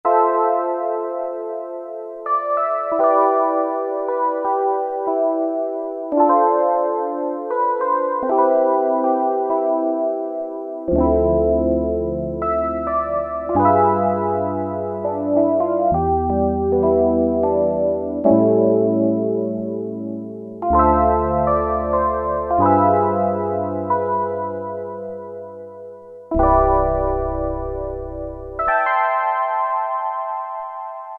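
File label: Electric Piano II, Roland Juno-106; Factory Preset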